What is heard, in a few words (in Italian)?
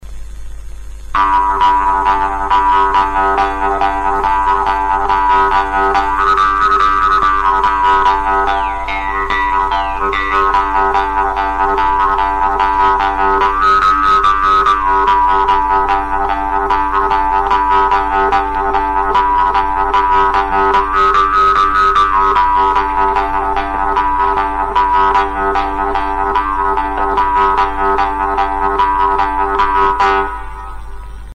trunfa, strumenti, ardauli